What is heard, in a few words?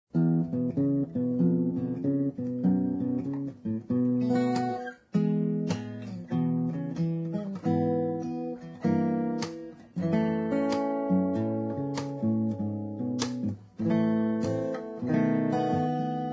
Guitar Acoustic; Zomboid; Lifestyle